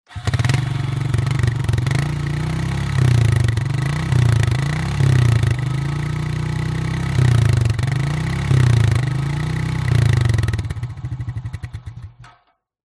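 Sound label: atv quad bike start revs off exhaust perspective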